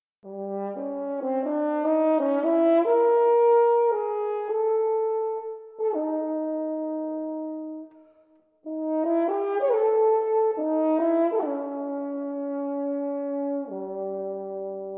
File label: horn solo; Kamionka; Messiaen